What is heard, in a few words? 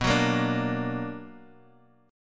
B Minor Major 7th; strummed; Bass Standard; JGuitar